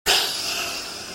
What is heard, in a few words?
tire composite; gmod; svmod